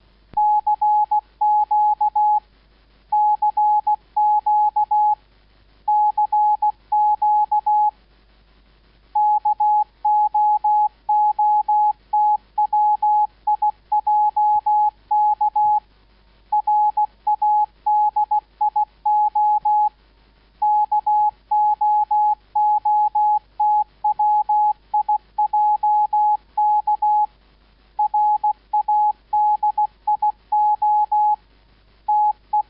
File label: CQ morse; KWKR